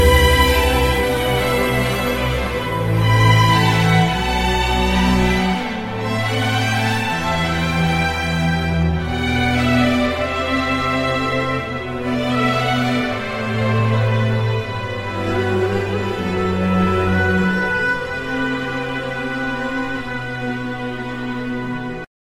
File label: instrumental